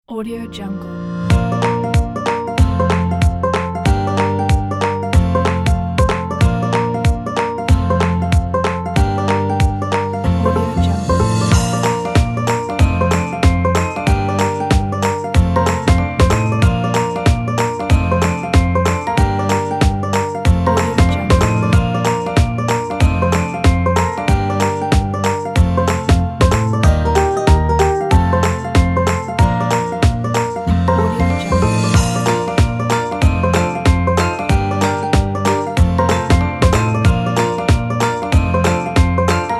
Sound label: playful happy kids